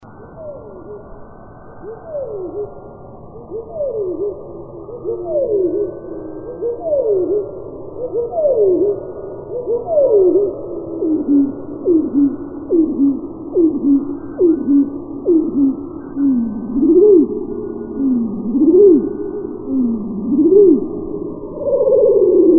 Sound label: bird slow